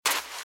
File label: Footsteps Sand Dry Run Right; RadwinIsland